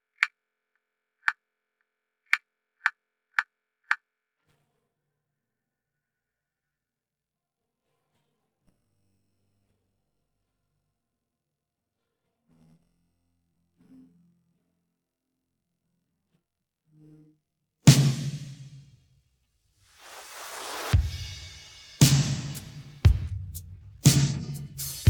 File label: drums